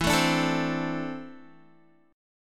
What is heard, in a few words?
E 7th Suspended 4th Sharp 5th, Guitar; E7sus4#5; strummed